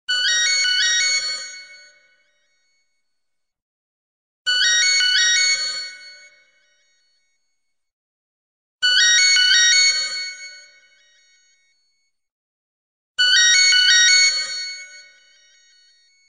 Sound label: nokia domiso